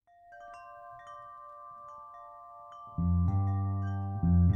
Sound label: waltz; flatbush